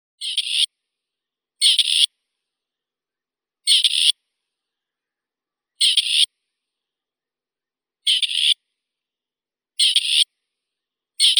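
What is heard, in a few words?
grey partridge, Perdix perdix; kuropatka seraya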